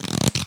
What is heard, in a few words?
Shuffle; Google Solitaire